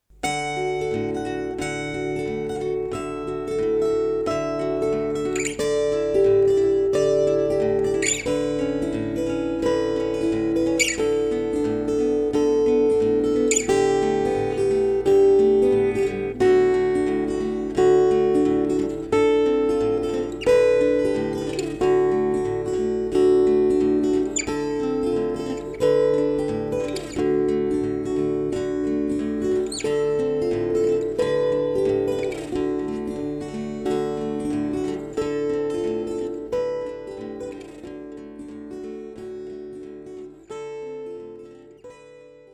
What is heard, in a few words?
3 finger